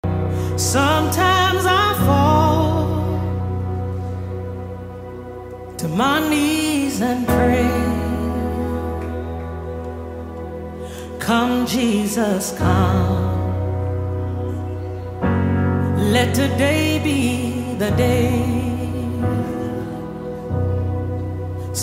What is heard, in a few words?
singing sample audio